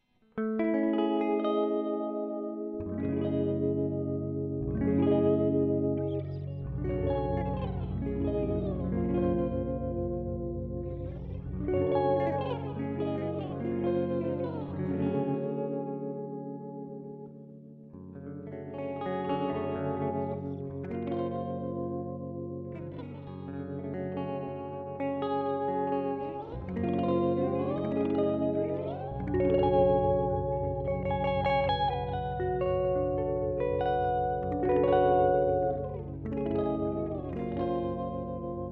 Tempus Delay Transposer; Specular Tempus

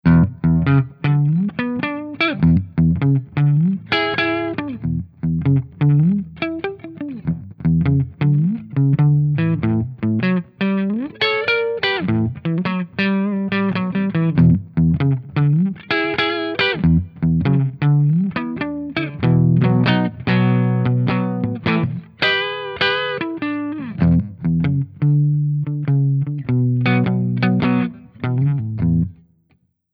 Gibson SG Neck clean

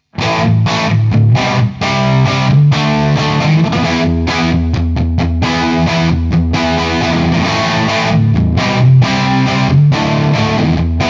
Hi Input Warm Gain full; Artisan CH2

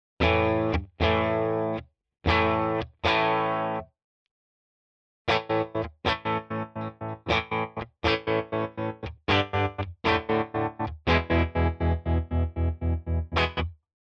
Walrus Fundamental TREMOLO Square hard

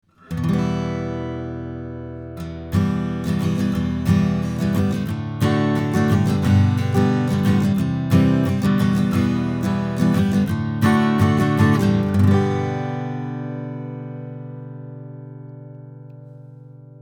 Steinberg AXR4 Ac Git 20cm 15th Fret

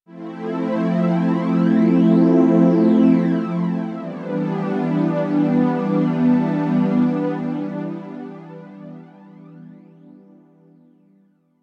Flanger

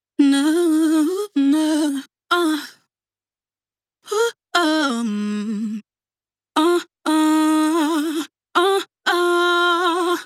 Soul Voice Console MK3; Softube

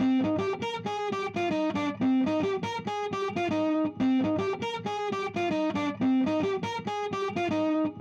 Acoustic Guitar Plexi59 and Cabinet; Antelope ZENQ

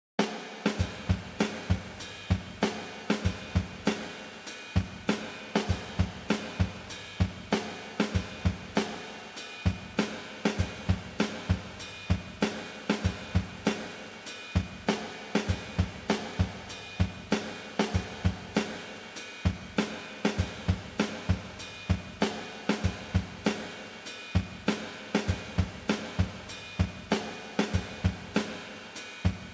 Eventide SP2016 70ties Kit SP2016 ST Room Modern

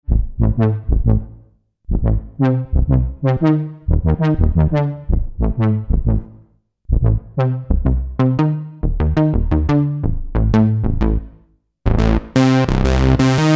SH-2 Fat

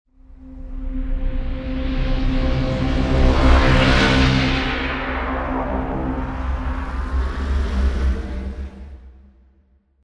Transitions and Impacts; Gravity 2 Menu XL

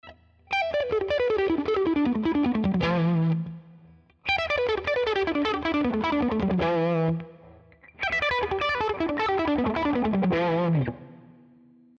mid gain All positions Lead; Cort MBM-2H

